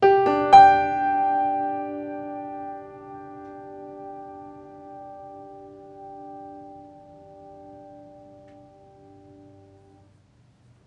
Music Level Fight Stinger; Florence

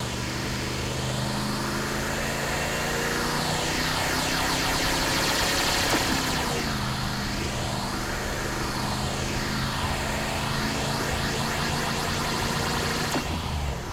vehicle time machine drive; teardown; ps5 windows xbox series xs

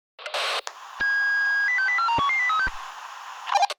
chopper start; teardown; ps5 windows xbox series xs

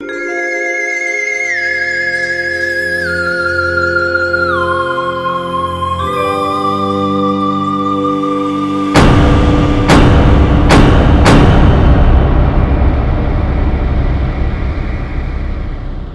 Shortloop Cinematic eeery Epilogue; tales from space mutant blobs attack

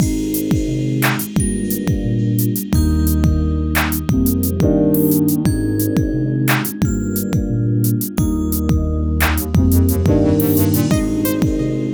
Kids 3 - C - 88bpm

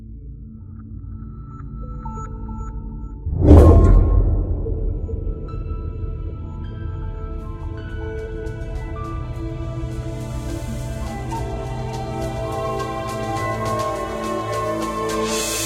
battle; Yotsume God Reunion

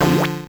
level squish D F; earthnight

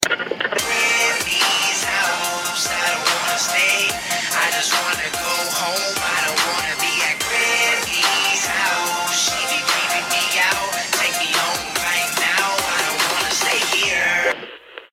House Radio; Granny Chapter Two; Granny's House Radio

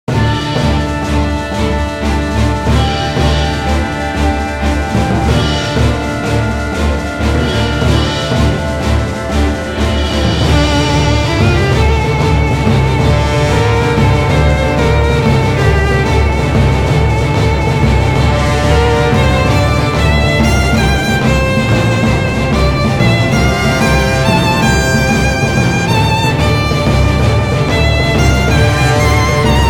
Normal Battle Intro

